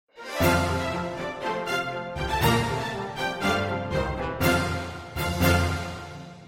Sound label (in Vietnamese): nhac nen trao giai thuong